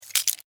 Insect Claw Snap, Insect, Claw, Snap, Movement, Bug; Sound Effects:Animals:Insects; Blastwave